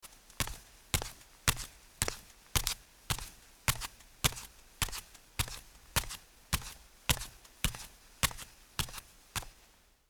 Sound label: Footsteps Bare Up